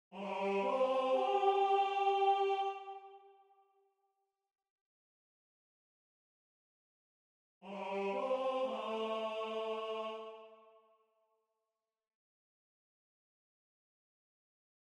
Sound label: prima parte contralto